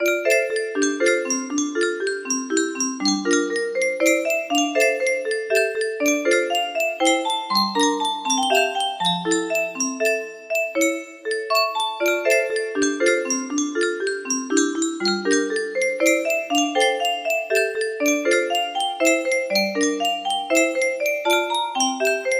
waltz, music box melody

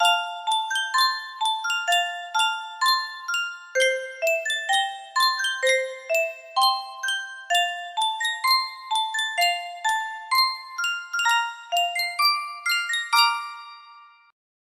Yunsheng music box

